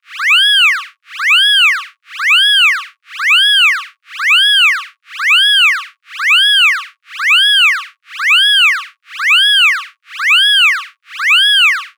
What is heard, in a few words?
alert